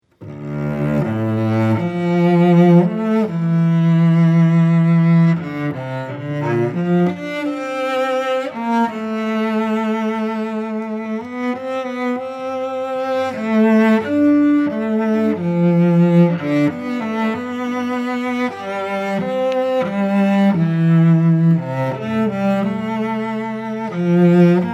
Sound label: Adagio for Cello; octet